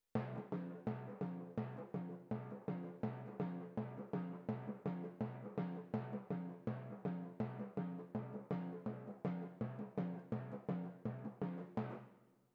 Timp Close

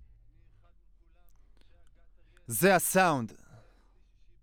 Voc OD

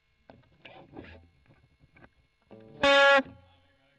E.GTR OD; Gefell

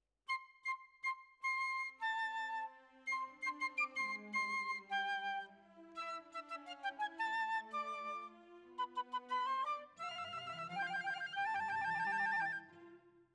Flute

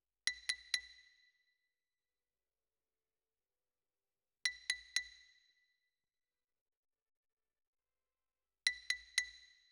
Xylo Close

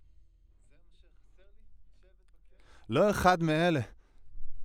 Voc

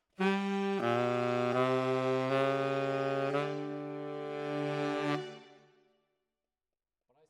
Tenor, RNR for piano

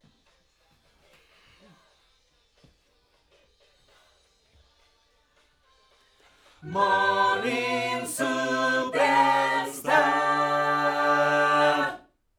Backs AKG

